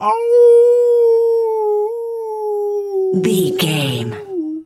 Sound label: wolf howl soft